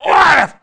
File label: SHOCK; Messiah